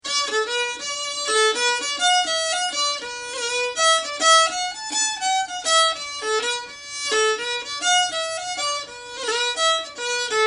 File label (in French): The First Pint (violon)